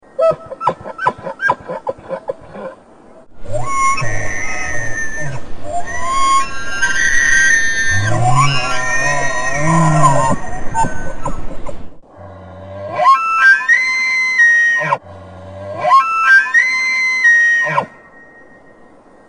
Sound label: elk bugle ringtone